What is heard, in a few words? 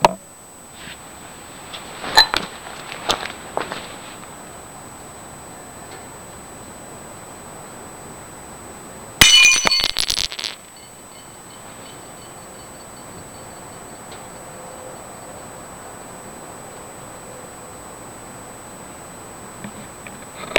Glass Break